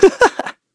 Vox Happy; Evan; kingsraid; voices heroes

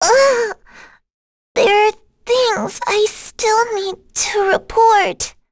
Vox Dead; Rehartna; kingsraid

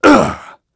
ice Vox Landing; Clause; kingsraid

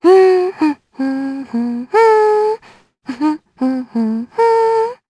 Vox Hum; Cleo; kingsraid

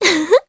Laugh, jp; voices; heroes; Laias; kingsraid

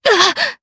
Vox Damage jp; voices; heroes; Tanya; kingsraid

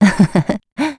Vox Happy; Hilda; kingsraid; voices heroes

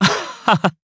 Vox Laugh jp; Siegfried; kingsraid

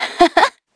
Vox Laugh Madness; en; voices; heroes; Xerah; kings raid

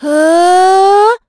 Vox Casting; Valance; kingsraid

